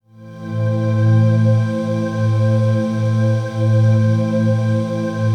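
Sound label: trance pad; Best Service; XX Large - Pads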